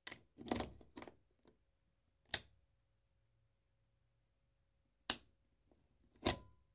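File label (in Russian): включение вилки в розетку включение и выключение света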